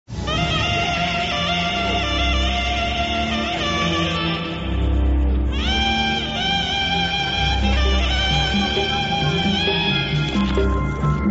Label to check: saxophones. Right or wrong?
Right